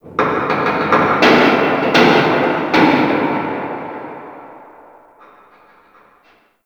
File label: Shipyards Distant Metal Groan, Ambience; Warframe; Grineer Shipyards